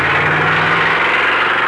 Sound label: Motor Drag Racing; Working Model Program